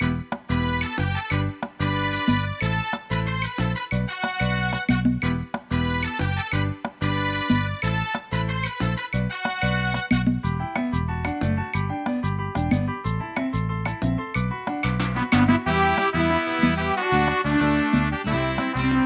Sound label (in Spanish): Salsa Moderna